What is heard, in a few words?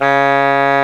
WND D#2; ORCHESTRA; Roland - LA Composer vol. 1